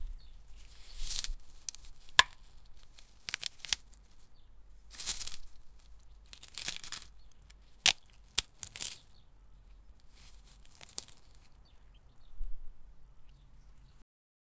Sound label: plucking vegetables from, farm